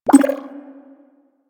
Blessing Thank You Bubble, UI; Warframe; Blessing Device Menu

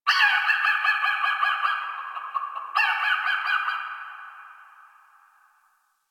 Drifter Camp Bird; Ambience; One Offs; Birds; Warframe; Quests; The New War

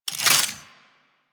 Speargun Reload; DE; Warframe; Weapons; Skins; Tn Hydroid Speargun

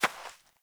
Fawn Footstep, Foley; Animals; Ambient

